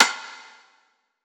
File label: perc rim; Drum One Shots; SD MIDNIGHT Sample Pack; Slate